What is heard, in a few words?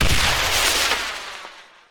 Rocket Start; Cut the Rope Holiday Gift; android ios mobile gamerip